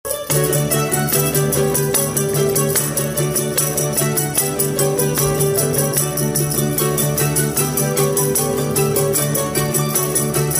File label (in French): Farandole